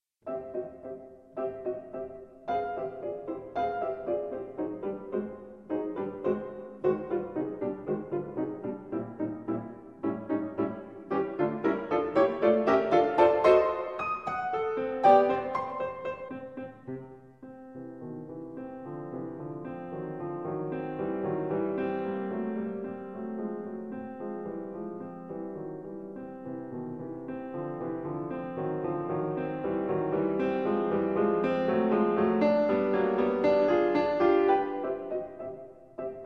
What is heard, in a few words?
Fortepiano; Nyanina skazka